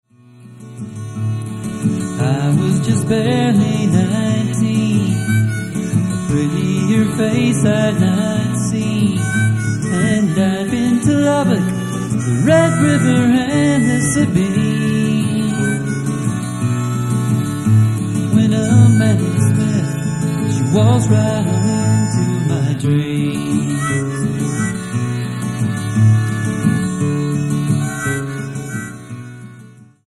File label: waltz